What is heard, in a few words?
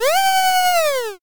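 scream male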